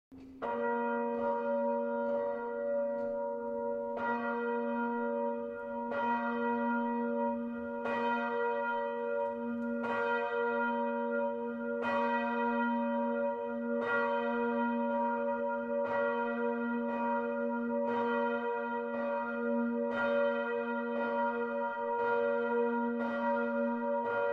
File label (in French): cloche sib4; saint materne 2014